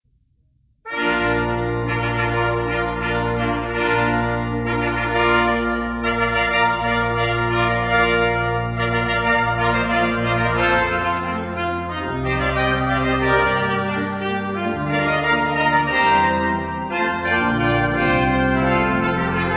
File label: fanfare, trumpets